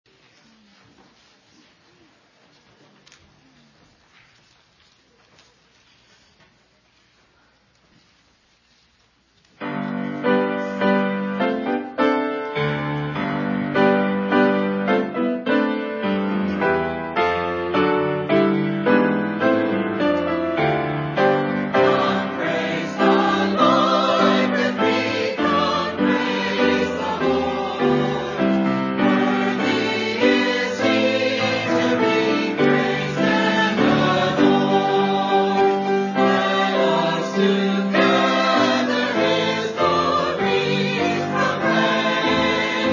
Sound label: choir